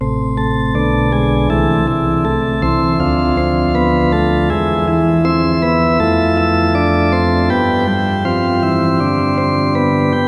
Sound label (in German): orgel